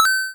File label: coin; Block Tower